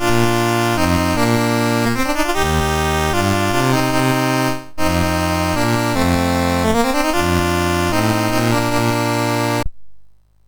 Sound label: sid MOS6581R3; Games Summer Edition menus